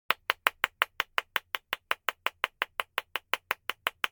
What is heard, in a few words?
Clapping